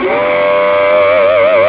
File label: axe hero